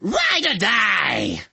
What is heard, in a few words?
rocket nitro boosters upg; warmonger